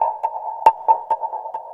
percussion loops 138, Techno; PS-45

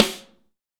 snare dry; Real Mega Drums

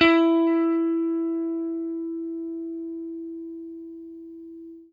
FENDER HRD, Guitar & Bass; Quantum Leap

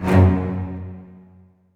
F#, HALL STRINGS; AKAI S-Series CD-ROM Sound Library VOL-7

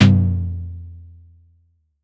Drum X Tom, Pearl

